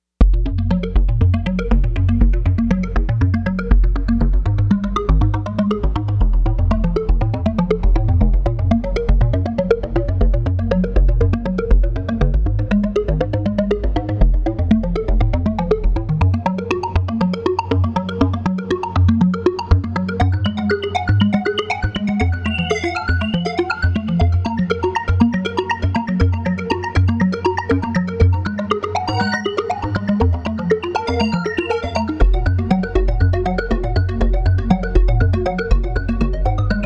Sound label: 120 BPM